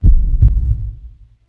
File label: slomo heartbeat; Max Payne